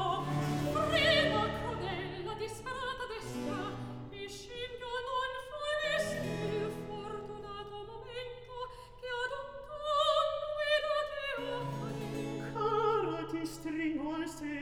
Recit; Act III; Frena, crudel; Handel; Ottone, re di Germania; Ottone, HWV 15

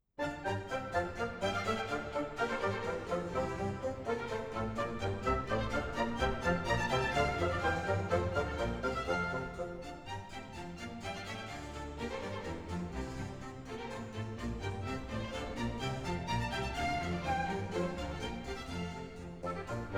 Bourrée; Music for the Royal Fireworks; Handel; Fireworks Music & Water Music

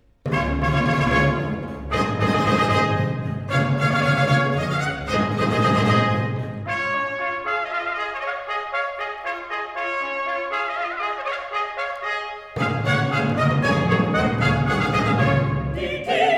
Chorus Di timpani, e trombe - Recit; Nella Brittania; Handel; Agrippina, HWV 6

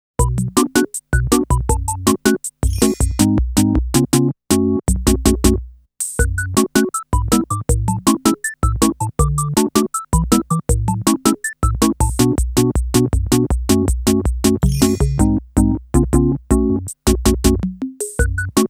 without vocals

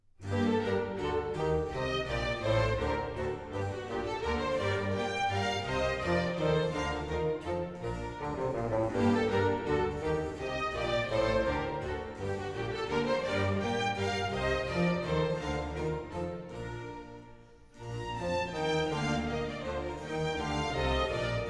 Water Music Suite in G Major Menuet; Handel; Fireworks Music & Water Music

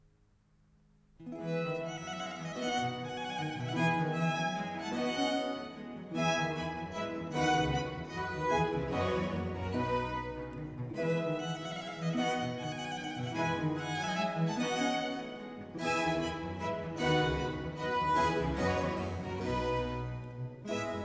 Concerto grosso Sarabanda (Vivace); No. 12 in F Major; Corelli; Concerti grossi, Op. 6

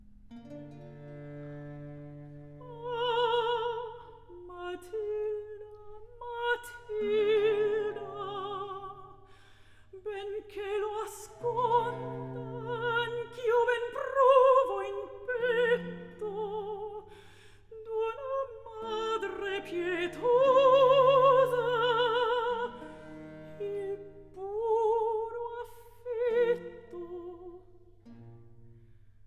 Recit; Act II; Ah, Matilda, Matilda; Handel; Ottone, re di Germania; Ottone, HWV 15

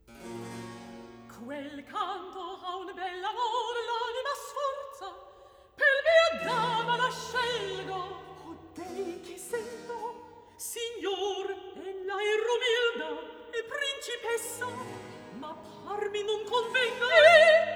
Recitativo; Quel canto a un bel amor; Händel; Serse, HWV 40; Act 1

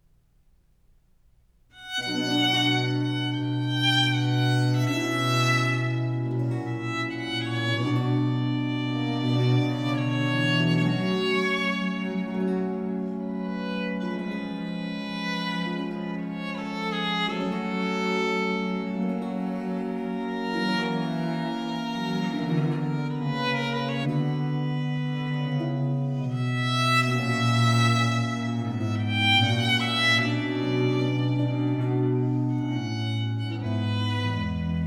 Ayres for the Violin, Sonata in C Minor, Preludio; Book 1; Italian Baroque Violin Sonatas; Nicola Matteis; Ayres for the Violin, Vol.1; 尼古拉斯 · 麦克吉根

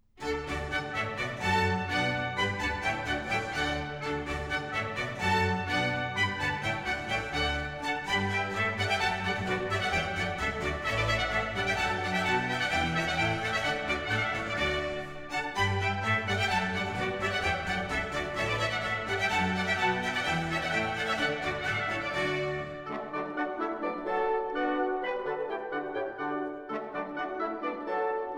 Suite No. 2 in D Major Bourrée; Water Music; Handel; Fireworks Music & Water Music

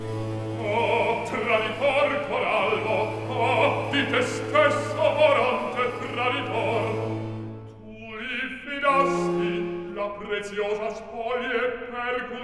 Recitativo; Act Three, Scene 9; Ah! traiditor Coralbo!; Floridante

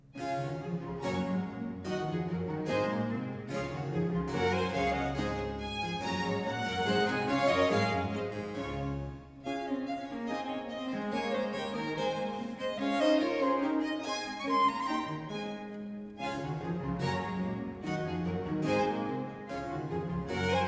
Concerto grosso Allegro; No. 7 in D Major; Corelli; Concerti grossi, Op. 6

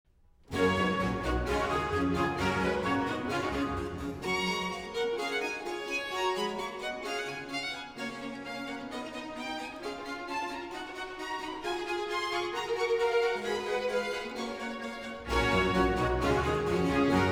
Concerto grosso in G Major Allegro; Op. 6 No. 1, HWV 319; Handel; Concerti grossi, Op. 6

